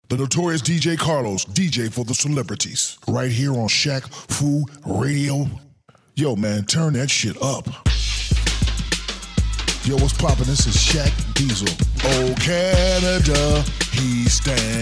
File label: HipHop